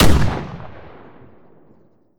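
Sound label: romeo870 fire player, weapons; gmod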